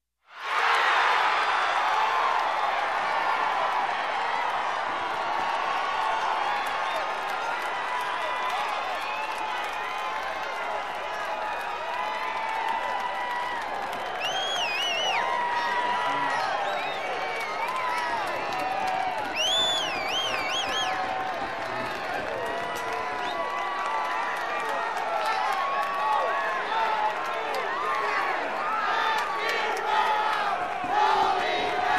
Crowd in a game cheering and whistling

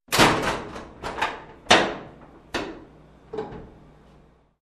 Compartment rattling

